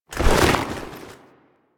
Idle Screen Foley Fast; Warframe; Corpus; Corpus Lich

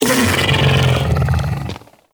Bug Vocal Death; Characters; Warframe; Hunting Critters; InfKDriveBug

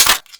Heavy Pistol Mech A; Warframe; Weapons; Zariman Heavy Pistol

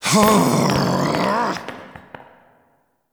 Storms Out; Warframe; Entrati Labs; Cavia Rank Up Scenes; Tagfer

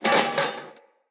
Foley Armour Body Fall A; Enemy; Mockery

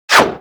Bullet Parry Metal; Impacts; Bullet Parries

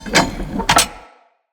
Smithy Metal Misc; Ambience; Soul Plane

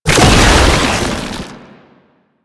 Stun Start, Foley; Enemies; Warframe; Proto Infested; Babau

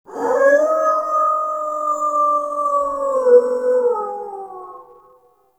Howl; Edens Ultimatum; Amar

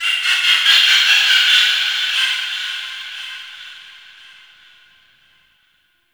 Forest Distant Bird Of Prey, Ambience; Warframe; Grineer

